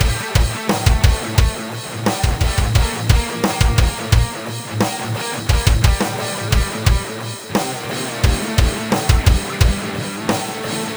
Audio loop; Hooligans: Storm Over Europe

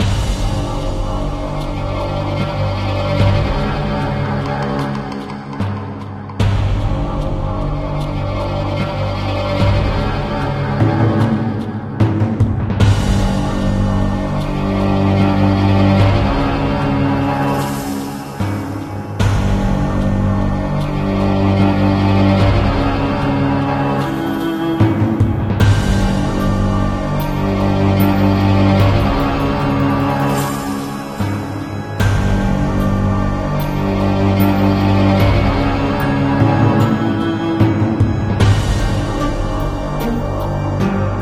Scary Cave

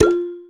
point count; Acrobat Bullseye

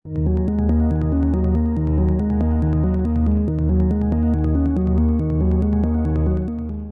loop eternal beat

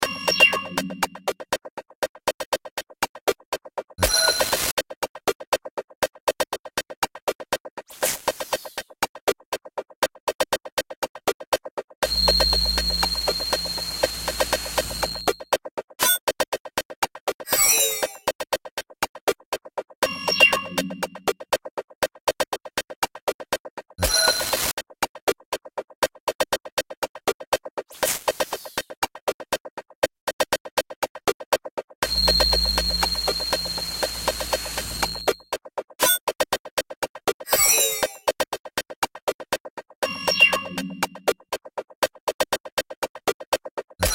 Music Beat Distortion Vintage Video Game; Blastwavefx

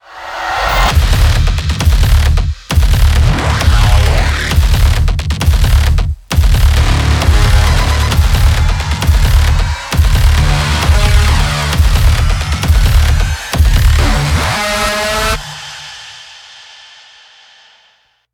PVP Lobby

